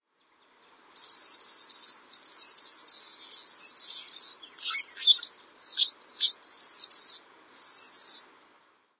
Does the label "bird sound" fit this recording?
Yes